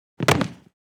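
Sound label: body falls onto wood; Parkour; Butterfinger Video Sound Effects; Additional Parkour Sounds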